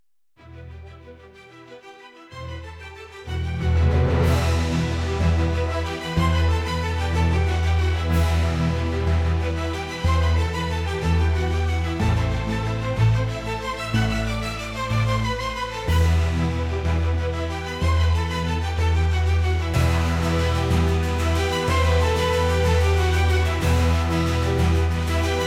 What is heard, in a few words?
Unnamed Instrumental